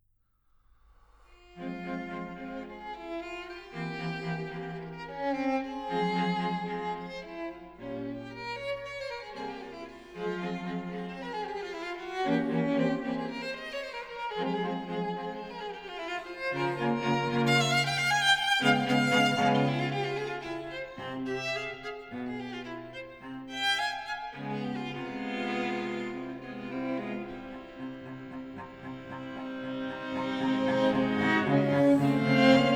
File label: string quartet moderato